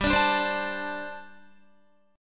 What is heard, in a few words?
G Suspended 2nd Suspended 4th, Guitar; Gsus2sus4 strummed; 14,17,14,17,14,17